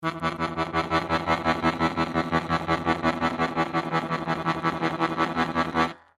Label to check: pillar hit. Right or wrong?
Wrong